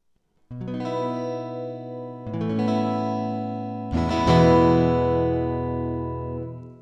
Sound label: redhat sample; Linux